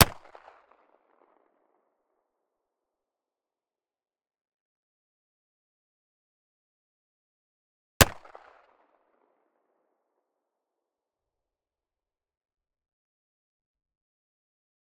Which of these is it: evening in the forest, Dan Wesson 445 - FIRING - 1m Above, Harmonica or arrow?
Dan Wesson 445 - FIRING - 1m Above